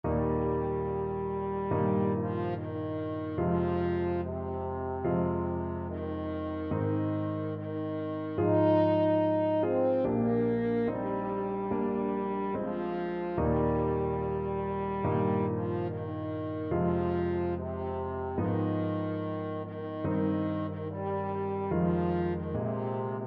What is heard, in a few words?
deep river, french horn